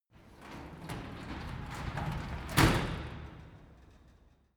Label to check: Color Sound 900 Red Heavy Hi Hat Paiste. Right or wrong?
Wrong